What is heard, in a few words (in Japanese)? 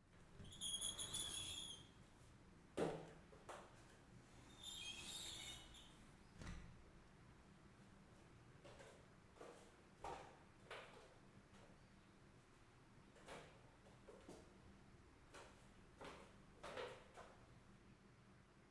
お風呂で健全マッサージ; 左右反転; 極上の癒し～夢見月の湯宿一泊二日専属仲居付きスイートプラン; 夢見月の湯宿本館